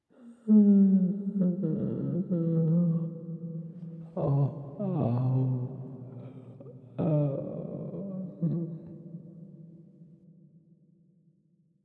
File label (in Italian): lamento uomo; Inquisitor 3